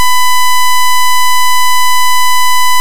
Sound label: sawtooth